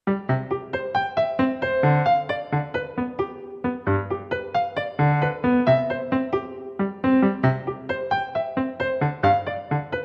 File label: Latin Staccato